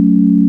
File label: sine; ものづくり基礎工学（情報工学分野）; 音の波形と分析